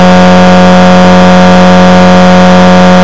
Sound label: b4 petrol medium, engines; acf engines